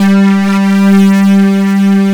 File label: BASS G3